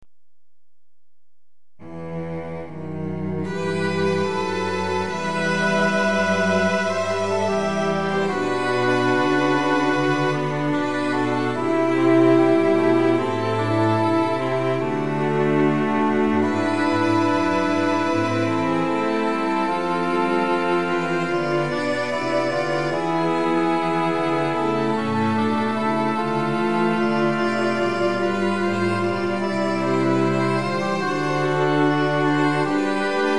Midi Journey To Bethlehem Fl Ob Fl Fl VC QN 74

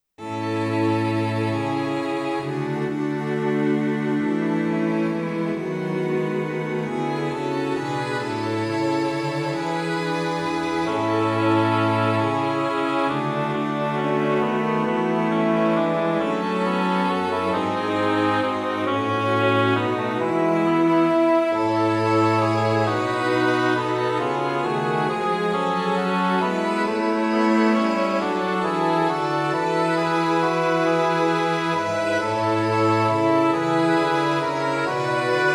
Sanctus Fl Ob Ob Fg Str; MissaBrevis d

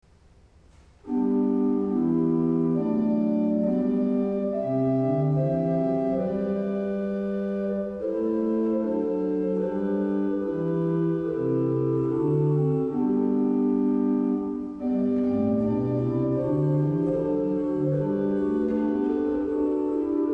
Organ Theme; Looking Up At The Stars

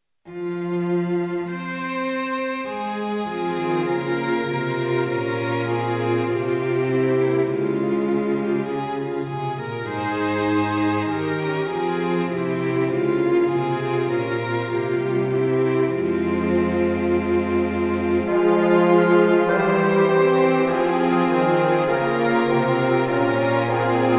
Another Harmony, Str Tp Tp Fh Fg, Fast

